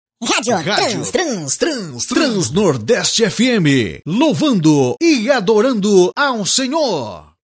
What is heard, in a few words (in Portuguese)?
vinhetas gospel; transnordeste fm